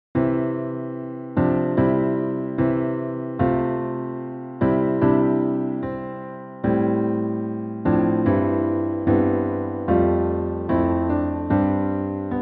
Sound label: piano